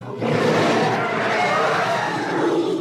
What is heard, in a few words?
Carnotaurus roar